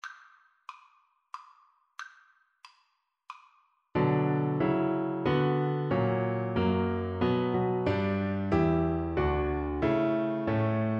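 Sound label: VLC karaoke, Cello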